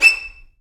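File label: Vio spiccato E6; Digital Sound Factory Virtuoso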